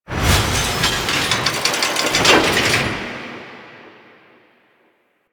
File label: Arsenal Open; Interior; Ambience; Warframe; Nineteen Ninety Nine; Tailor Shop